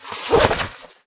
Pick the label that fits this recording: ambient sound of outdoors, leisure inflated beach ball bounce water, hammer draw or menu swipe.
hammer draw